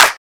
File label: Clap; Dubstep; Cymatics; Dubstep Starter Pack; Drums - One Shots